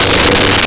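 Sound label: Synth Bass G3; Nintendo; July 2020 Gigaleak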